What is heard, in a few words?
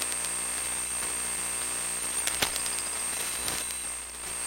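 ambient hum buzz loop, electric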